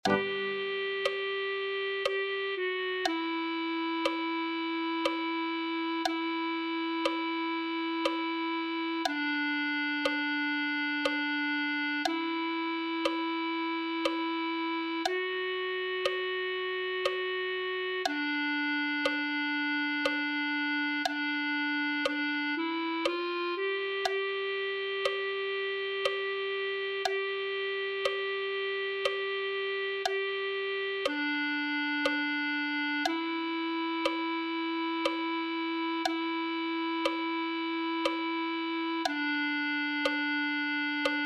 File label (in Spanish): clarinete